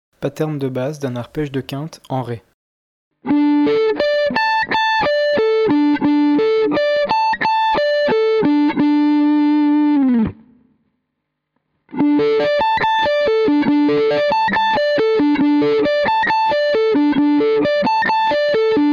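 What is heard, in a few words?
fifth arpeggio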